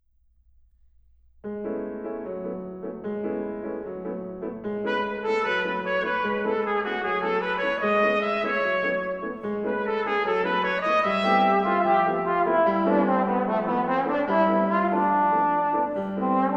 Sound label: Fandango for Trumpet Trombone and Piano